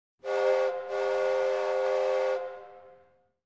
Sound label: train whistle; wonder-junction